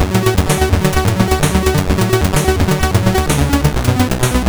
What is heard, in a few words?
sid, CSG8580R5; David Music Demo 2